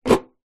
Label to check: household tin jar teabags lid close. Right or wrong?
Right